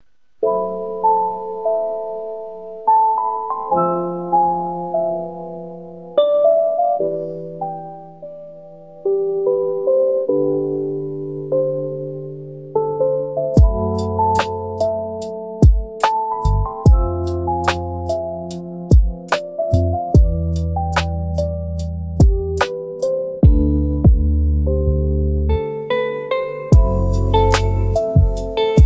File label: rainy lofi